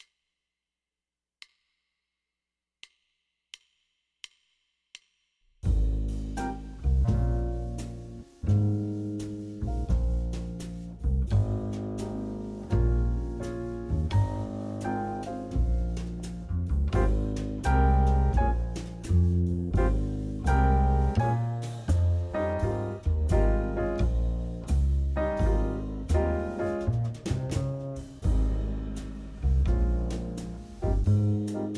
ab dur klinge, saxophone

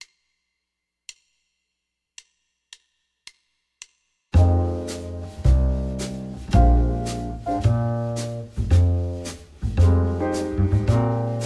gm7 c7 tenor

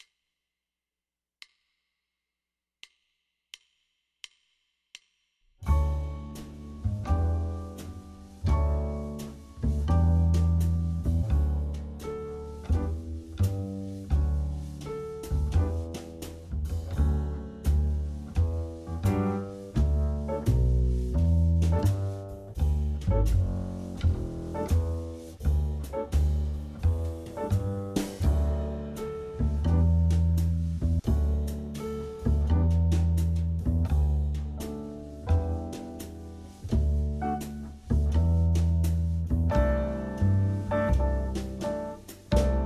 d-dur klin

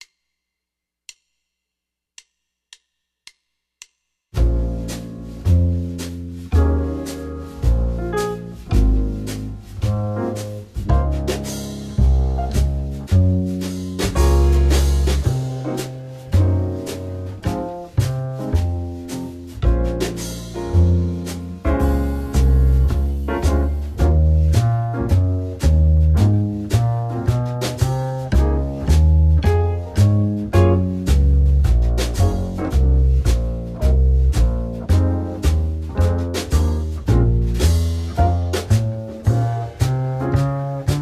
vollkadenz tenor, saxophone

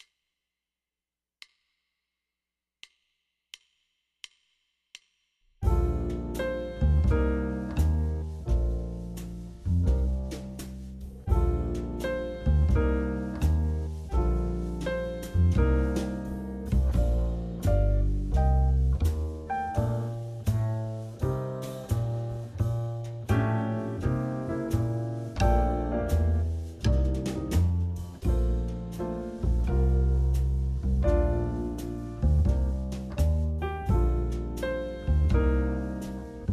cmaj abwechsl, saxophone

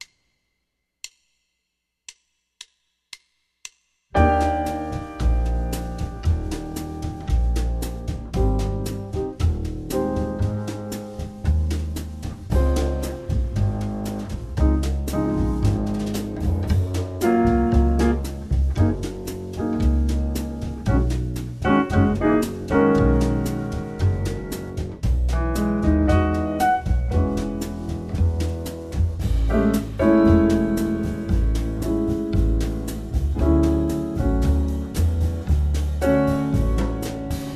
2 Takte Dur alto

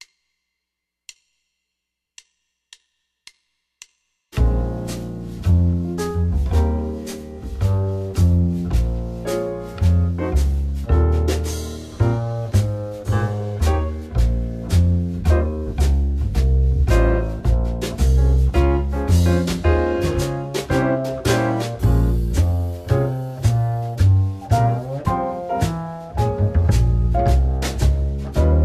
gm7 c7 alto

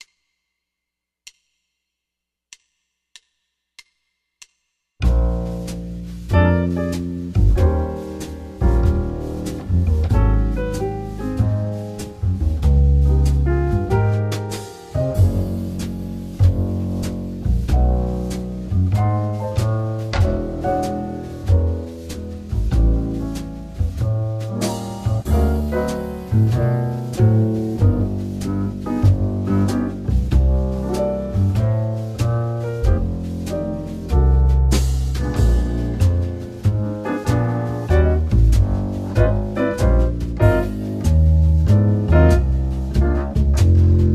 Dominanten tenor

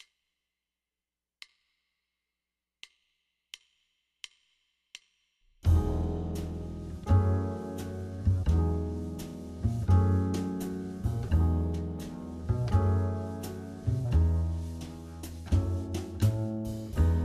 e dur, saxophone

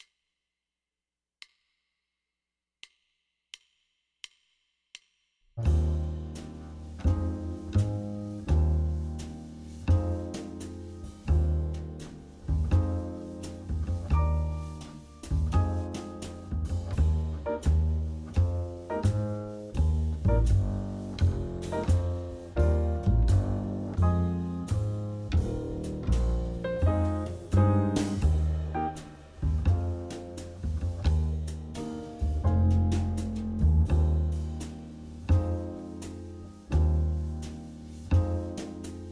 cmaj abwechs altsax

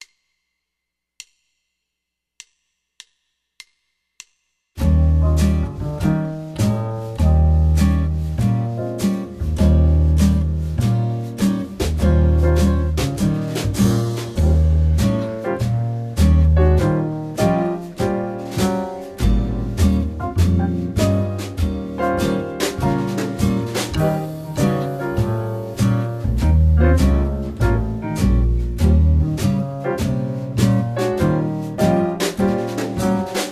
4 takte c7 alto, saxophone

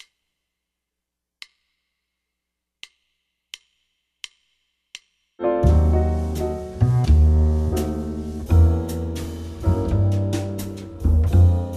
vermindert alto, saxophone

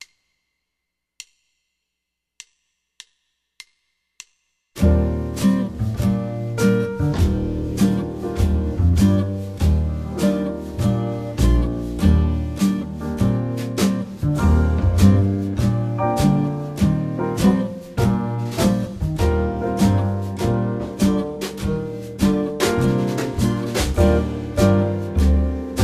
4 takte cmaj7 alto, saxophone

